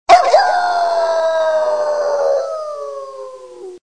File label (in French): Loup, Animaux; bruitage